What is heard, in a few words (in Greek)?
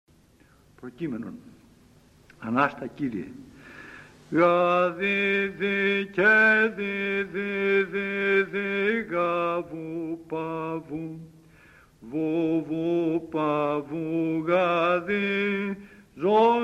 ΠΡΟΚΕΙΜΕΝΟΝ, Psaltichie; ΑΝΑΣΤΑΣΙΜΑΤΑΡΙΟ; ΗΧΟΙ